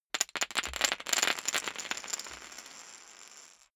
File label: poker chips drop